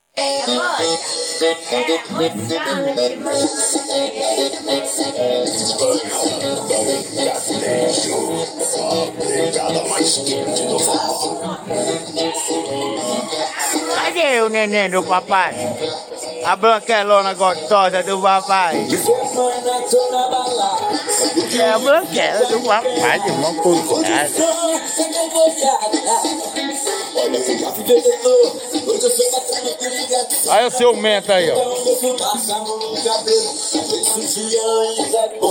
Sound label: audio vocals